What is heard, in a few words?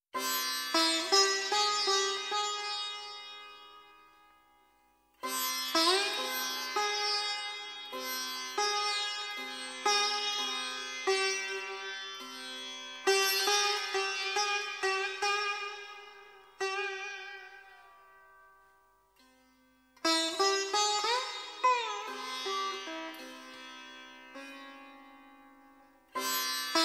Sitar Scene; Media Music Ltd; Short Cuts From Around The World